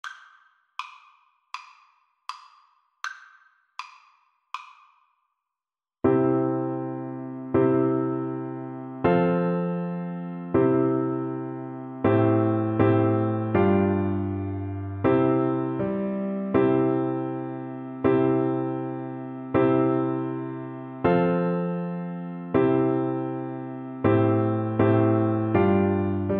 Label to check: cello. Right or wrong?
Right